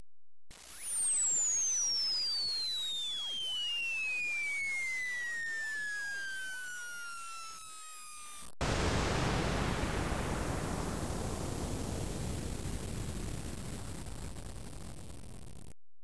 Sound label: EXPL; explosion sounds